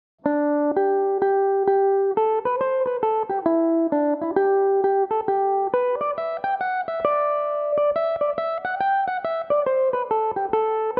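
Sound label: G major, mandolin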